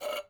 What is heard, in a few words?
ui pickup can empty; Rust